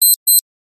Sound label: household watch digital alarm high frequency beep